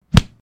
brutal hit

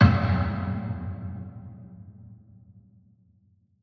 designer dark wood hit; SoundsCrate

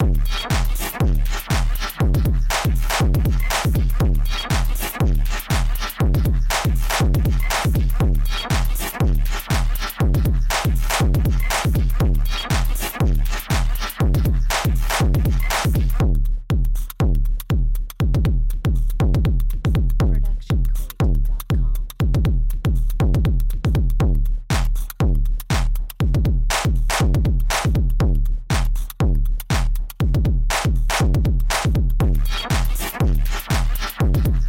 techy beat; soundscrate

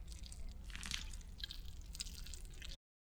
stylized squish; soundscrate

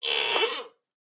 Chair Squeak

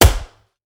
Gun Shot; SoundsCrate

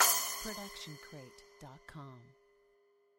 splash cymbal 10 inch; soundscrate

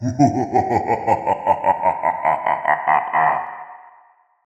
evil chuckle; soundscrate

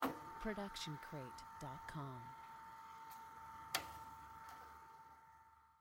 VHS start play

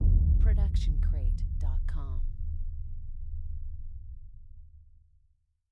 trailer hit dark drum; soundscrate

